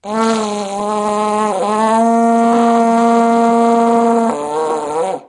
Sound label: Vuvuzela